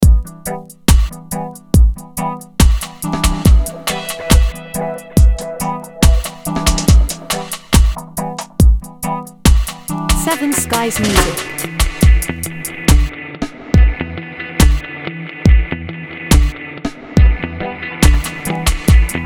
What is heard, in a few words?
reggae - dub